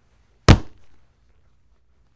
Football Catch Dull

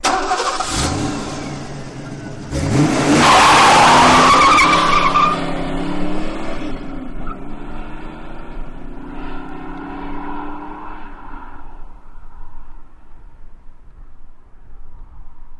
Mustang Start and Hot Peel Out Away

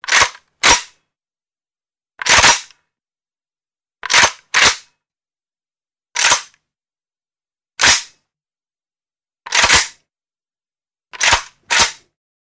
Gun Loaded Heavy Met, handgun mvmt

